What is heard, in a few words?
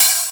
DANCE SET, DRUMS